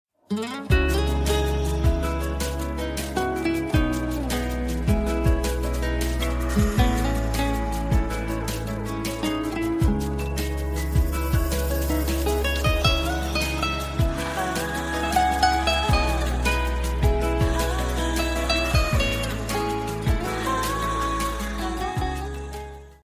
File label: instrumental